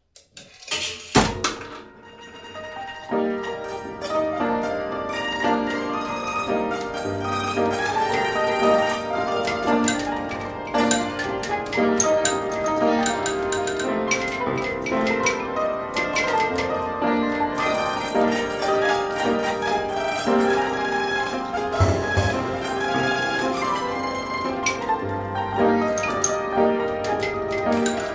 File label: Orchestrion